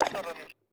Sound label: general up down movement, user interface; gmod; mw